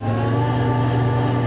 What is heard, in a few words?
SERVO